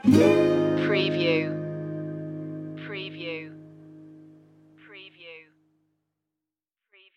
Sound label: tiki beach